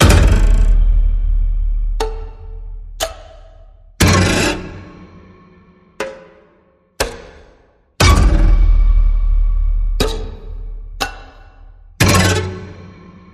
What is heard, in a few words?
Sinister Plucking